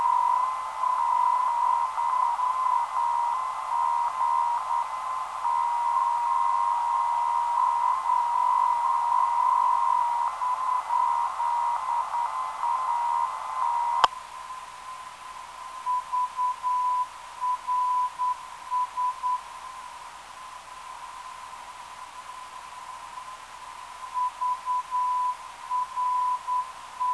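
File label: 400 Hz 8Hz filter, non-directional beacon; VRS; 369kHz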